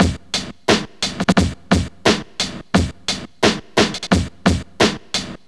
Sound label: Searchin Break; Jungle; Breaks; Blu Mar Ten - Jungle Jungle - 1989 to 1999 Samplepack